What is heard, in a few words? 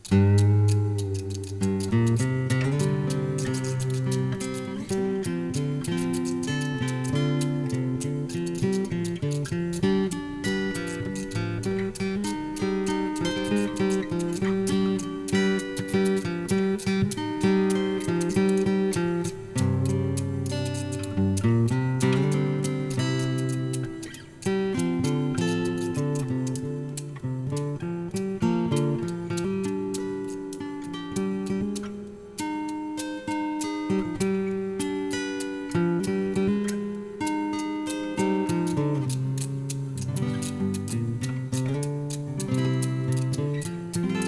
music outro; Ty The Tasmanian Tiger 2 Bush Rescue; XBOX